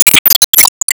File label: Se Item Get